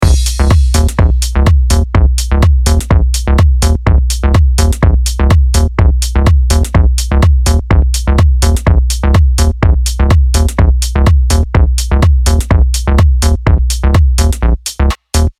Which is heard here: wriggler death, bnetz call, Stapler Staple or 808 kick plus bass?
808 kick plus bass